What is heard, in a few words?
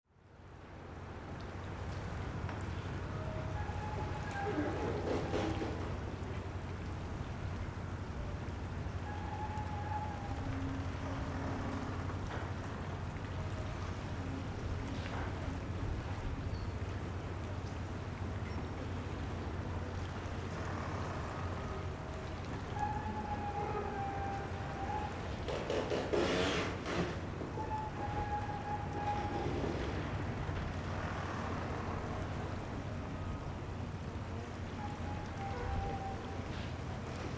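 Dock Ambience night Light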